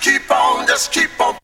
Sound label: VOC